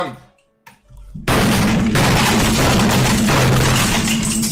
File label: keyboard smashing sound